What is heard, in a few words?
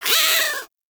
Forest Rodent Vocal Angry Short; Vocals; Warframe; Characters